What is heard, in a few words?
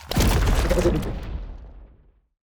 void worms bombardment; Stellaris; grand archive; weapons